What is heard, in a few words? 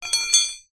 impacts metal steel twisted bar small drop hard ground